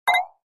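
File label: Pop Up Double Hollow Rattle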